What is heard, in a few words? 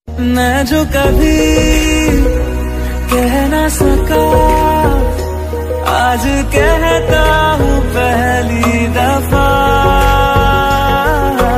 bollywood love ringtone